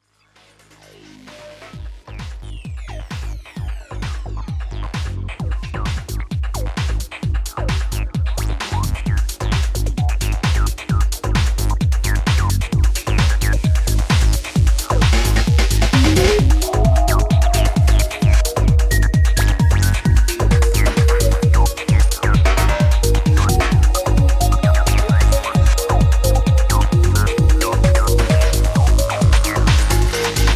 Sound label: Bass Xertz